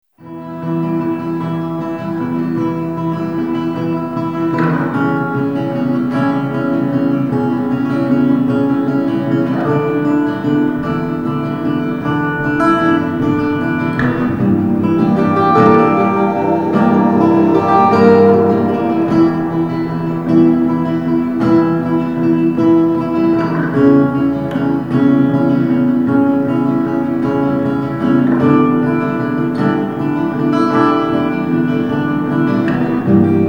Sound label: Instrumental